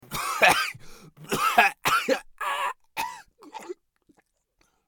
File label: bear death; eft